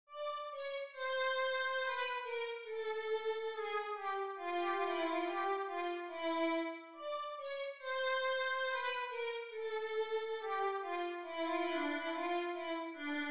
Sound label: habanera violin